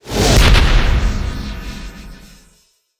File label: big Win Text; netent